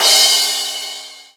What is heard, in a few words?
Crash, Cymbals; Vengeance; Essential Clubsounds Vol.4